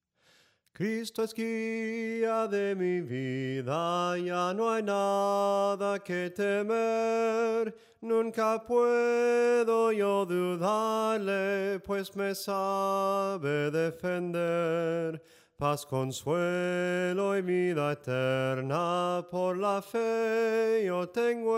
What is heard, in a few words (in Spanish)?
SOPRANO CANTADO, himnos en melodia soprano